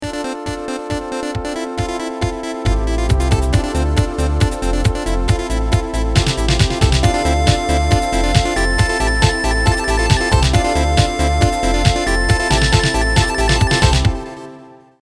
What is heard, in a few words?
Sinclair Beep Beep